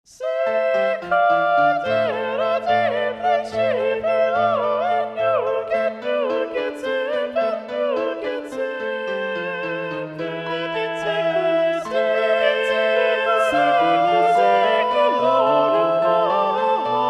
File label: sicut erat magnificat SSA, balanced voices; niccola porpora; ed. hunter